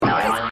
bleep; amazing digital circus

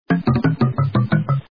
drum loop; yoshi

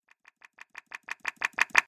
macbook stable

